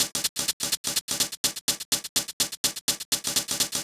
DEEPHOUSE BEATS 125

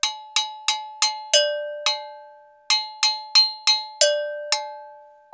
ETHNO EXTRA 90; ethno2

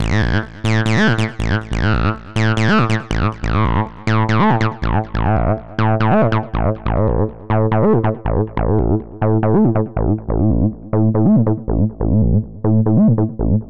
HARD BASS 140 A, Hard Trance, Bass

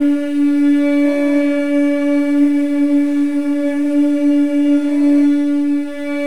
horns pad

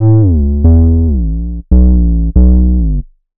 ELECTRO BASS 140 A

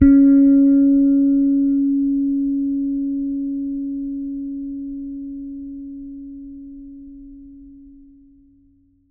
T-BIRD BASS FINGER C#4; Bass Mace; DirectWave

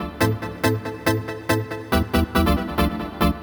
TECHNO SYNTH 140; Keys

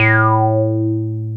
TB 303 BASS

